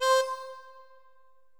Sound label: harmonica